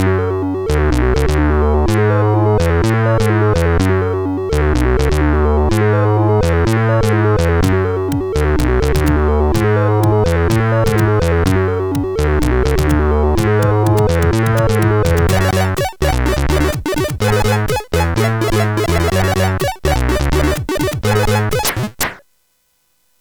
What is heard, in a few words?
intro, CSG8580